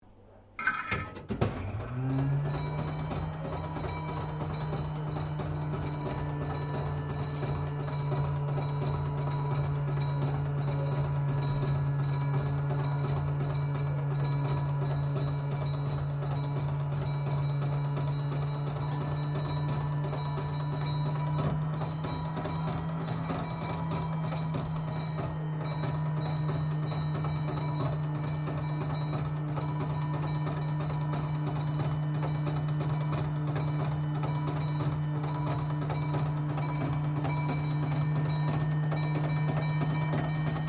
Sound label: SLOT MACHINE FIREFIGHTERS RESCUE OPERATING, Various old coin slot machines; Slot Machine Firefighters Rescue 1930 Operating